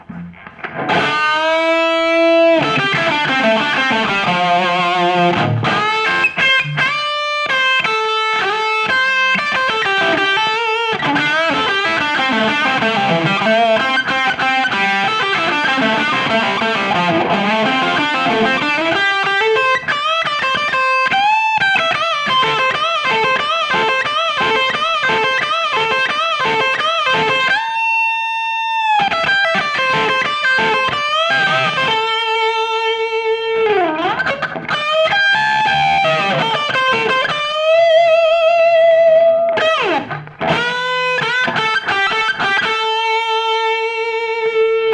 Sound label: Lead Off Axis Mic